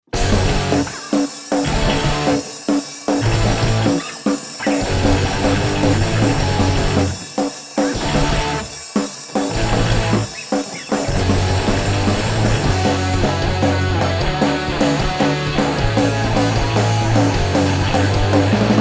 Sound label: raw no vox